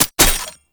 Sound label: Bayonet Mech; Warframe; Tenno